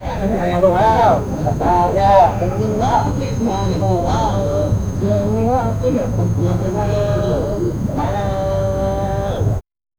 africa etiopia ambient

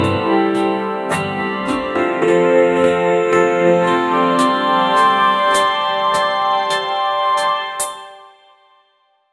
inspiring passionate, inspirational

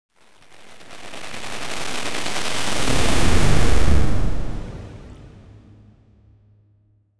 glitch sound effect that

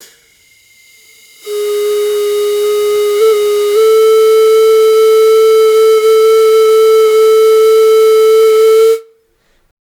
breathy pan pipe solo; wind instrument

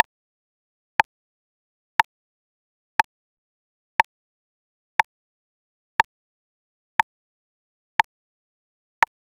short wooden click for a; solid

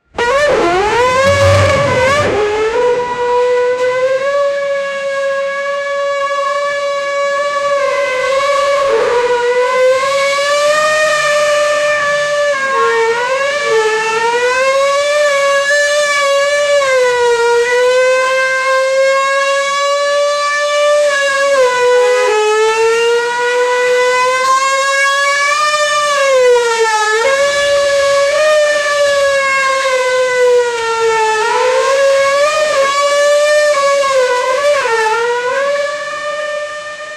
mclaren mp44 1988 f1 soun, formula 1

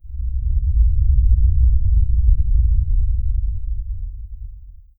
sound, atmospheric

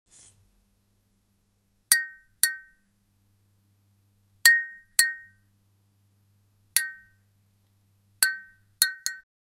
a sharp glass clink follo; human sounds

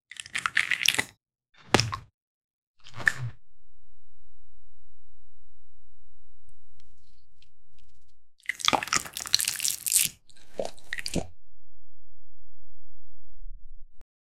positive background sound

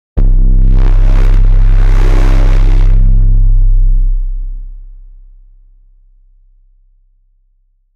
drum and bass

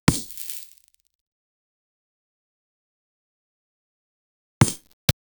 breaking dirt tile with; game effects

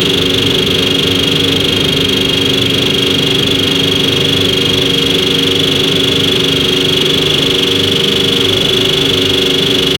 create a v16 engine for, hypercar

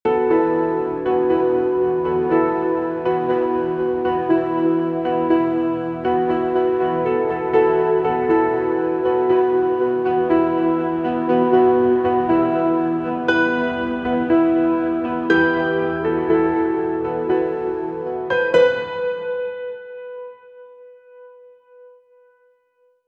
sad moment falling petals

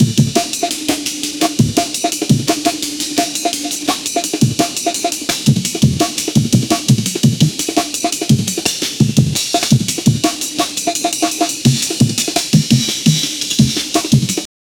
broken amen break, breakbeat

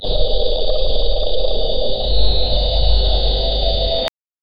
computer sounds noise

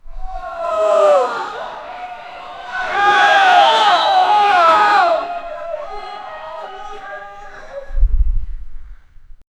multiple people running a, panic

